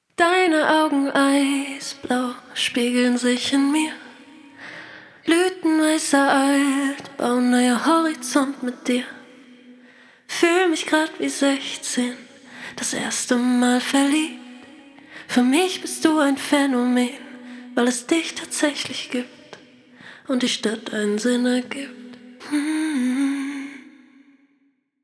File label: Vocal Reverb Side Filling Comp